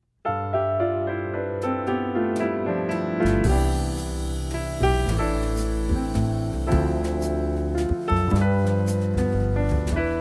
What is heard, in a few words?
music box